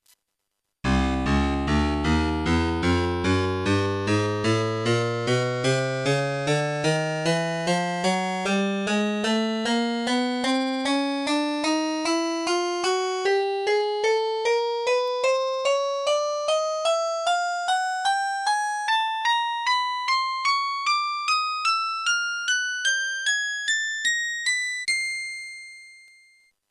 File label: tones